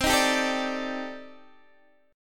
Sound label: C Minor 6th Add 9th C Guitar DADGAD